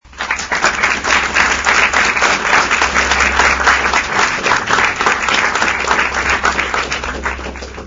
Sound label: taps